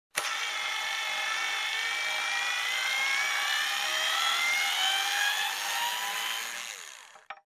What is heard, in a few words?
Electric Screwdriver loosening screw